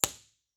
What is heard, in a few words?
IR